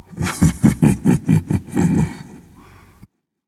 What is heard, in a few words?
laugh; smite; Cthulhu